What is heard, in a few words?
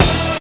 stab; Zero G; Man Machine